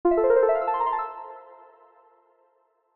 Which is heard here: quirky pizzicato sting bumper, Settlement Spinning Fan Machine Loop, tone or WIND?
tone